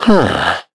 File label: Vox Human Think; en; voices; heroes; Shakmeh; kings raid